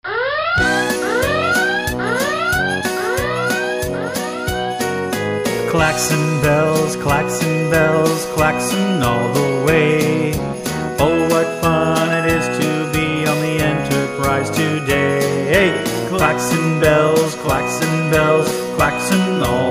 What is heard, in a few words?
Klaxon Bells